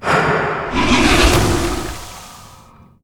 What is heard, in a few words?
leviathan, monster